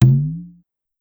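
Conga Lo Up Slow, Drum Machines Clavia DDrum-AT Pitch Envelope; 300 Drum Machines; Pitch Env Various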